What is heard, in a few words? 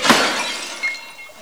bottle; Goonies; Adium